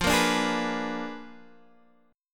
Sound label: A sharp 7th Flat 9th F